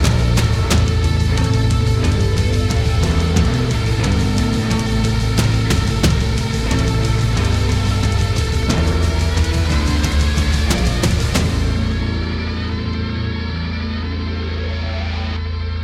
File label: mus recall; Smite